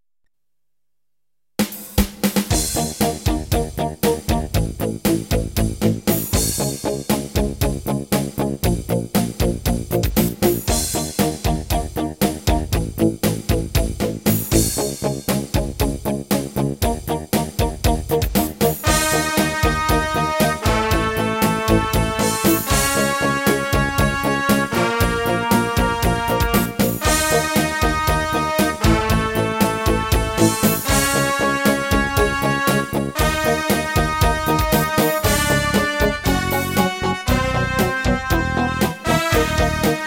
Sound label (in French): Rock